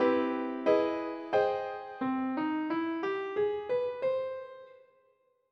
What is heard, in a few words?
augmented ex